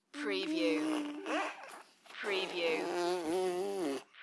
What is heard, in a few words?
Baby Raspberry; sound effects bible